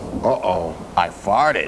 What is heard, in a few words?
i farted